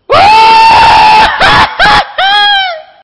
risada extravagante

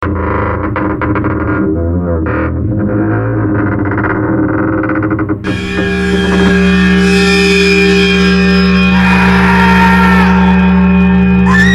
porta abrindo

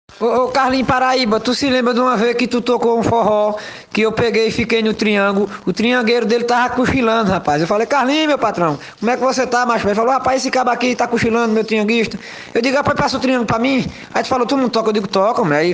nordestino tocando triangulo no forro

